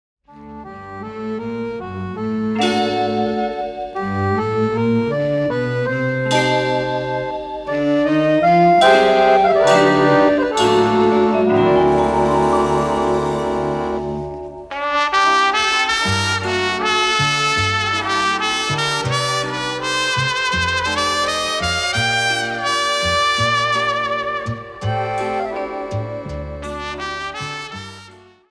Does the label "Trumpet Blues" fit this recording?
Yes